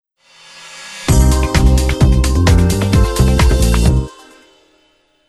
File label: dance tag